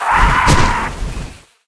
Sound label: queen dead, monster; moscutter